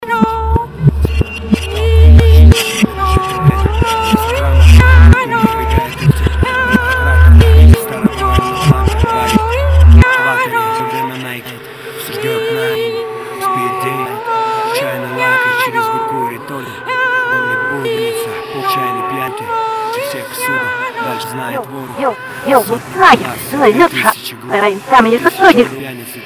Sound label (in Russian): реверс вступления